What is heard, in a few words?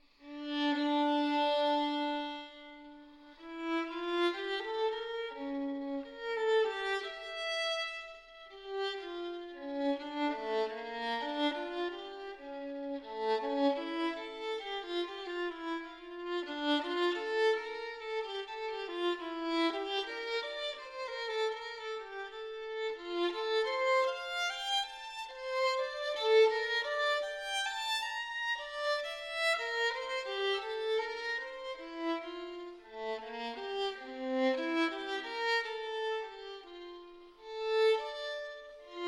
violin partita in d minor allemanda; violin partita no. 2; bwv 1004 i